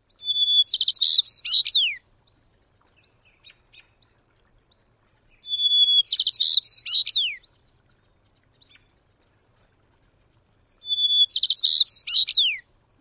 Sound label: songs